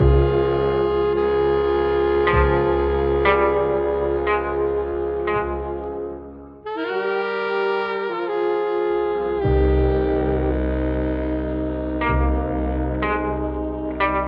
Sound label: sax, Loops, Sad